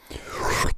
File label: scholp